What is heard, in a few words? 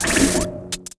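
war damage, Mob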